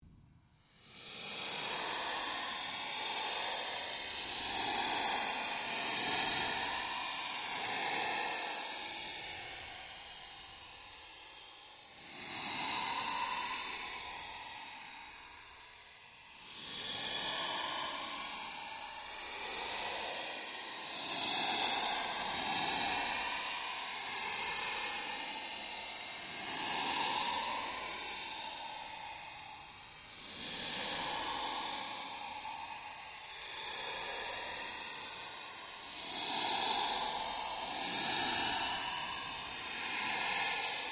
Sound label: Created Fluctuation Drone, Drone Sound Effects; sound building blocks created through manipulation of raw material